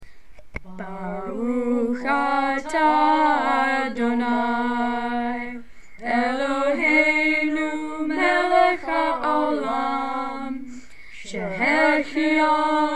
Hanukah Candle Blessing Sheheheyanu; Holidays

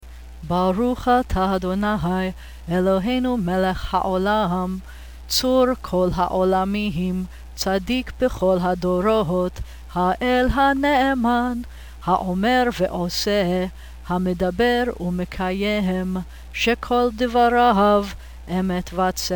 Haftarah Blessing after sung; Bnai Mitzvah Requirements; Service Practice

